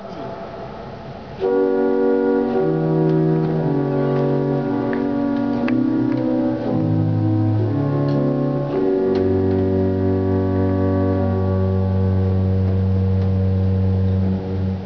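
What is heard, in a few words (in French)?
flûte harmonique, orgue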